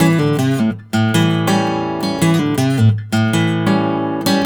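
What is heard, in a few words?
baritone riff, Blues Guitar; ILIO; Fretworks; 108 BARI RIFF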